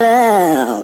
ow male SUNG, voice; ooh ahh; ow ouch

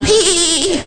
hee hee hee witch, laugh; ooh ahh

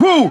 "woo"

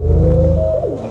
alien door, Zaps and Beeps

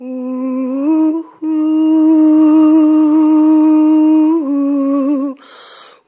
voice; Sad

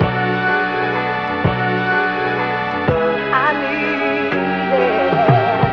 strings, Loops; Phonky

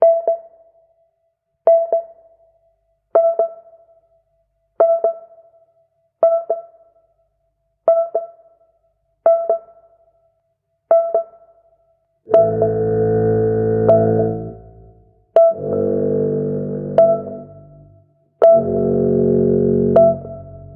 keys, Sad Loops

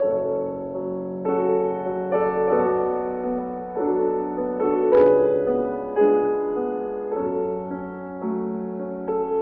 piano, Loops Sad

